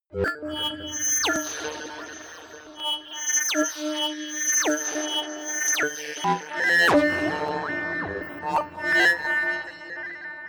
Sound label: huge distortion sequence